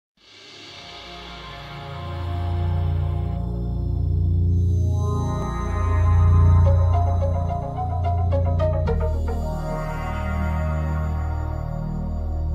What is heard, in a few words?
Base Ambience; Just Cause 4